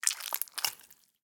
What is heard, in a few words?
gore slime creature meat blood short movement various; sonniss gdc